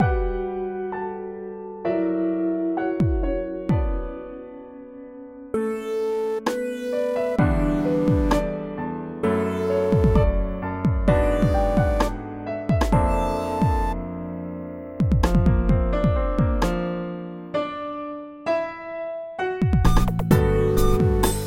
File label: mono lead